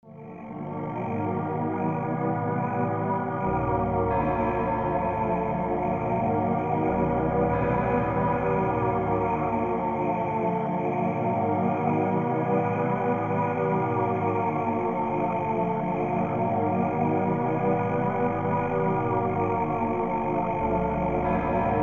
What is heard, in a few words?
melody; dark-ambient